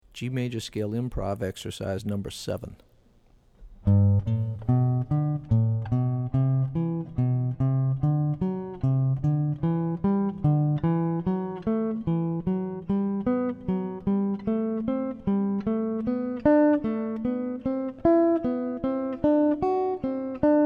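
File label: G Major Scale Improv Exercises; lead skills materials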